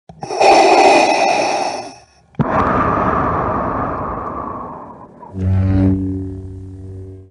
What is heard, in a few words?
lock chime; car locking